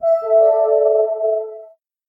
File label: electra, zvuki blu g90